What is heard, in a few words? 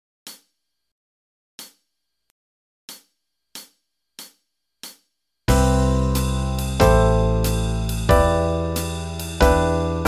Backing Track C major 92 bpm